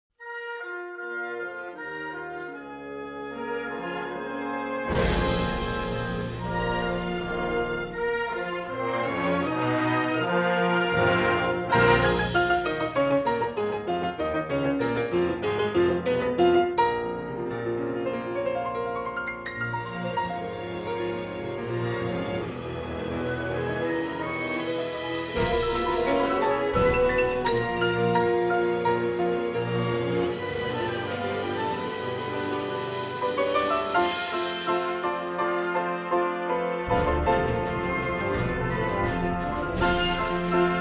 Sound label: Chamber Orchestra, Concerto for Piano; Croatian Chamber Orchestra